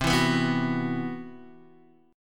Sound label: C Major 7th Flat 5th C, Guitar DADGAD